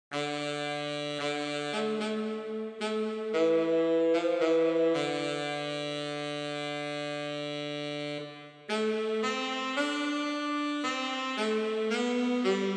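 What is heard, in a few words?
solo tenor saxophone; Scarborough Fair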